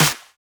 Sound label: natural snare drum sound d sharp key